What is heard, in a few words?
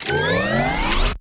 Charge Weapon